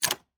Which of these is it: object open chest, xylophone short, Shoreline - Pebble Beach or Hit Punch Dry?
object open chest